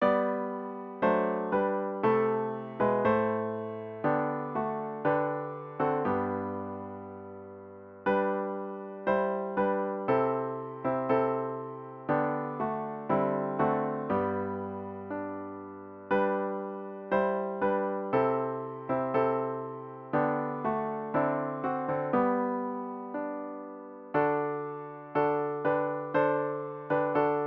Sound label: Savior Thy Dying Love piano with Intro